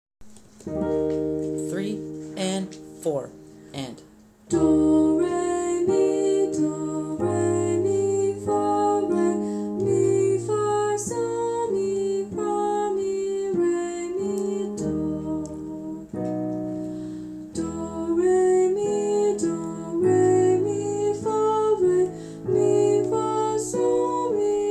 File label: Hee ah solfege